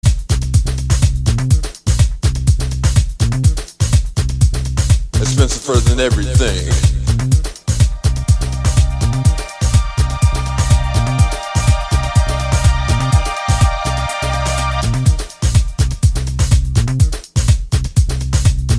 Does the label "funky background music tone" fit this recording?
Yes